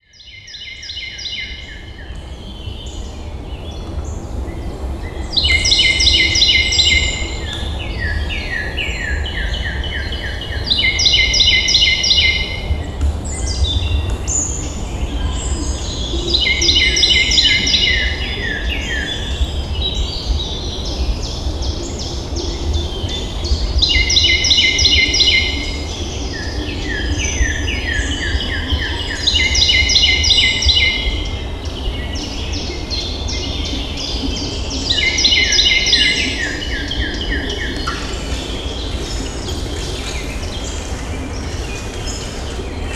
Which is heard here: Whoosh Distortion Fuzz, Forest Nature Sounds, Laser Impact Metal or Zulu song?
Forest Nature Sounds